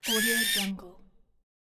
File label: small servo motor